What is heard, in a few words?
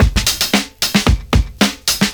70s Breakbeats; USB Soundscan vol.46; AKAI